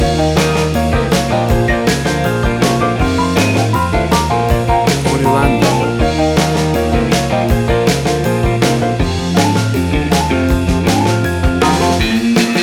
50s Prom Band

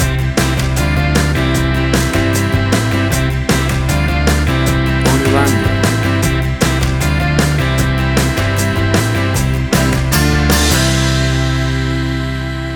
60s Organ Rocking

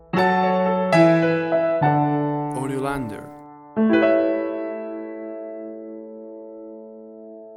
Adult Romantic Sitcom Piano Link

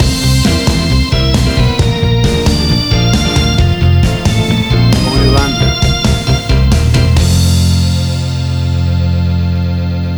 60s Swing Surfing

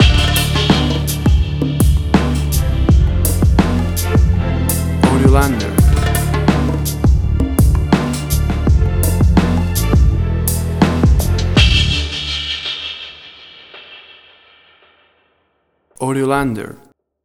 Torment Orchestral Hip Hop

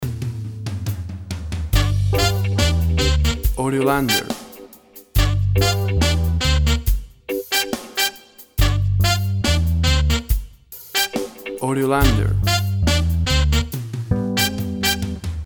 reggae splush

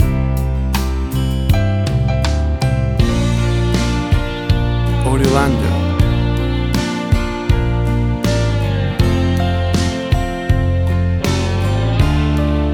Country Ballad Romance Music Theme

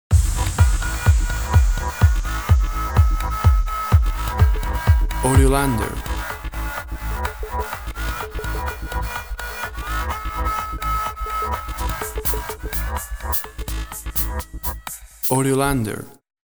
Top 40 EDM Stinger; Top 40 modern chart EDM. A fast paced piece of electronic dance music perfect for

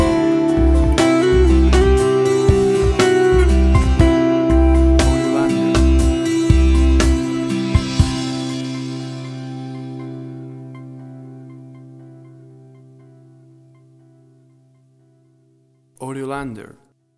Acoustic Summer Moods Stinger

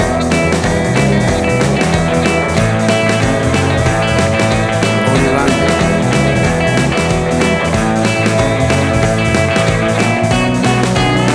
1960s Pop Club, royalty free track

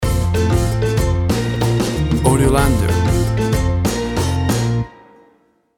Warm Summer Calypso Sunshine Music Stinger

